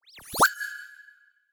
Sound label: Anime Sound